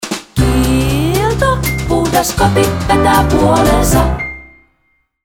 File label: jingle; Kiilto; mainosmusiikki